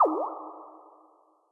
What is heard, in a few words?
water